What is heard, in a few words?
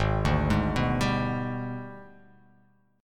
Ab Minor Major 7th Flat 5th, down; AbmM7b5; arpeggiated